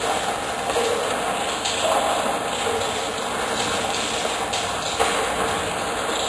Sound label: sewer; hl2; city strider